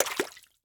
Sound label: Liquid Water Water Hands Smack Water